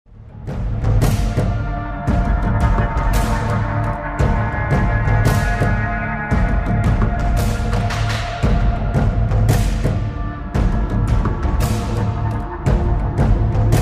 Snow Battle Music; ARK Survival Evolved

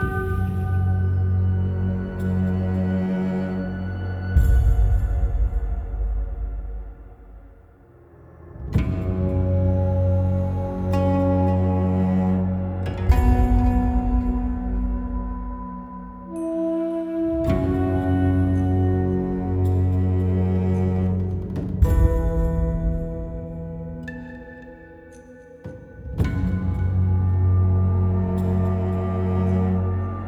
music; music 1-1 area 4

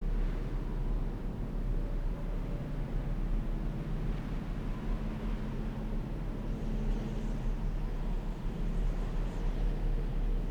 amb; HUB

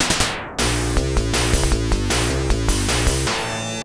Transition; VR Troopers; Genesis

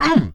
pain; minsc

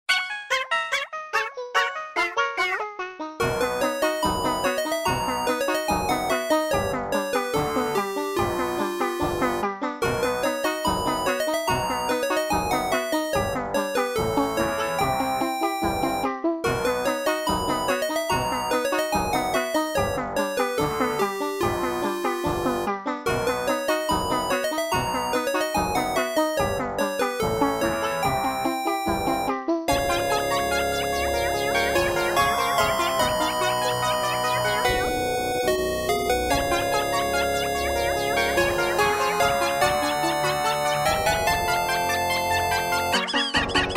Death Metal House; Undertale II Revenge of the Robots 2021

